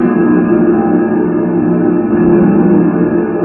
metal; de tabocas

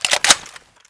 xm8 bolt pull; czero opforce

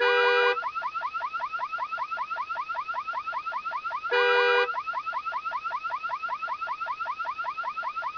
downtown car siren; dm heat

